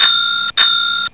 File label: bike bell